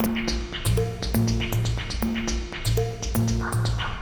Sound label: Synth Perc, Percussion Loops